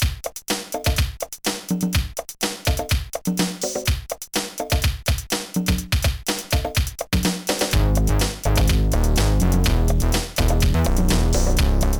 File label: 7 voice; Digital Mugician 2